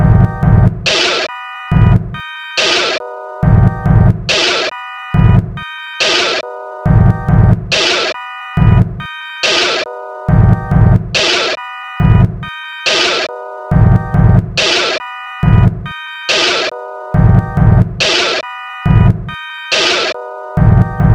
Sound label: Sine Wave